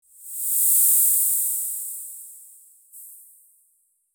aerosol spray sound, spa

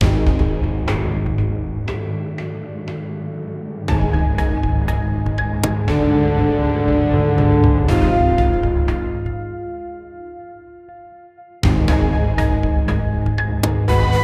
cinematic action thriller

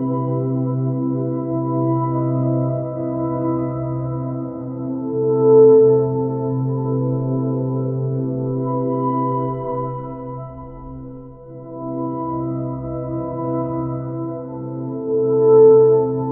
style titl, smooth end

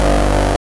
old electro motor; electricity

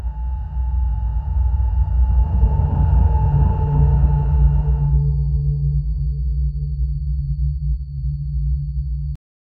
deep warm wooden creaks, airship

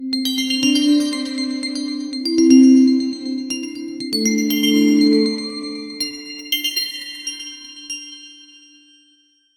premium modern melody wit, melodic

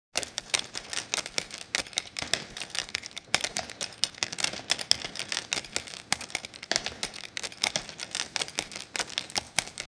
clinking running soldiers, armor

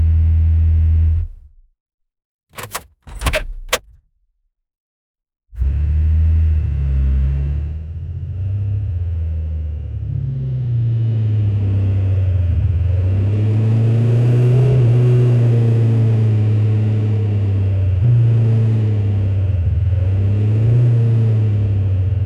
futuristic electric car

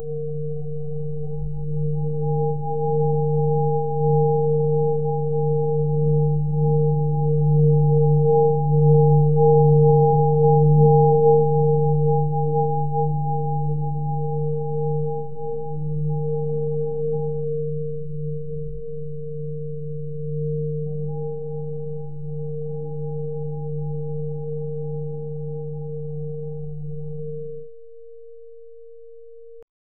crystal singing; sfx only

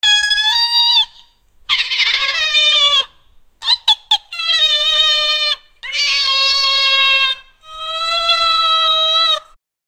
monkey sound

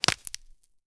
a short game sound effect, block breaking

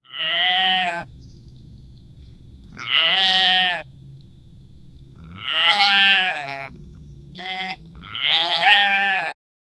a goat is bleating only

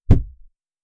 the dull sound of a, collapse

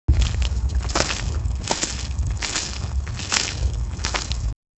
the sound of an octopus, animal movement

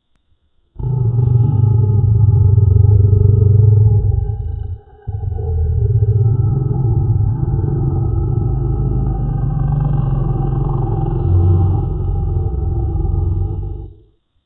lion roaring realistic sound; ferocious

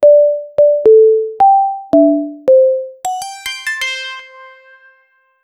iphone notification; notifications

